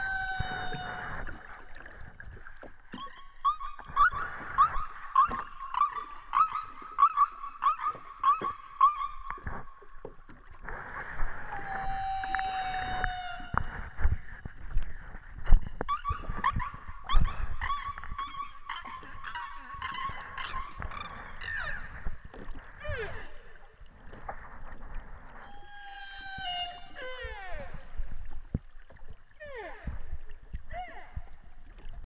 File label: humpback whale singing